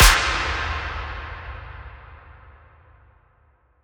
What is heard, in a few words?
Kicked Clap Impact; House; FX; Impacts